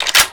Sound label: cheytech reload boltclose